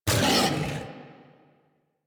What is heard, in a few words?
Feral Vocal Angry Attack Short; Warframe; Kubrow